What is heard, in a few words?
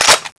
M60 clip in, weapons; m60craft; cstrike